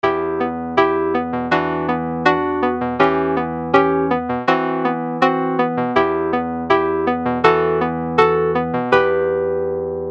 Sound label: electrikeys wurlitzer